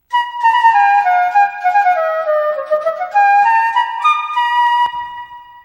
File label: Flute (thirty five notes); Harvest Moon: Magical Melody